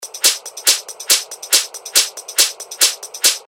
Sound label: 140 BPM, techno loops; VPN; Revealed Techno Top Loops Vol. 9